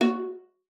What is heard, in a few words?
F#4, VIOLA FINGER; Xsample VOL-5